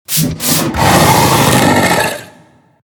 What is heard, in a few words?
Torghast Custodian Attack